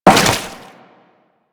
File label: Crewman Step Goo, Footsteps; Warframe; Corpus